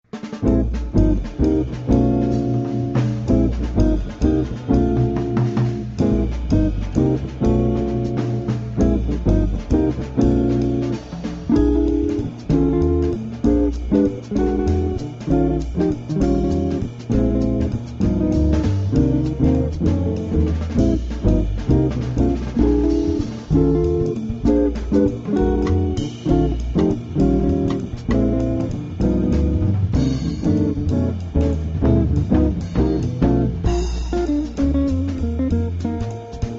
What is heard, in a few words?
jazz